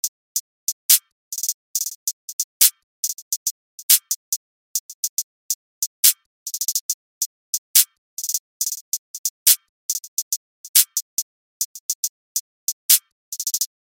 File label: Drill Loops